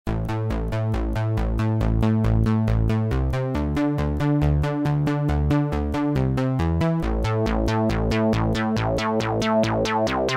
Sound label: FatMan Oct Seq, PAiA 9308 Fatman Synths